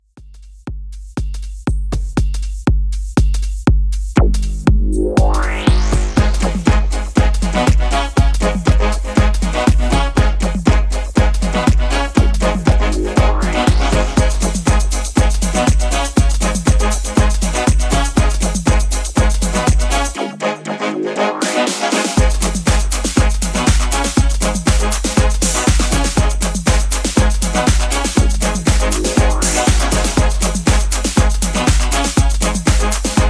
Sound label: 90s Dance House 120Bpm